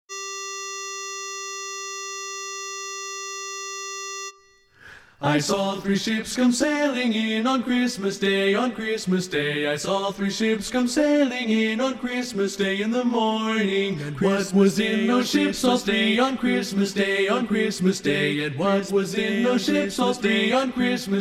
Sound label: Tenor, Learning Tracks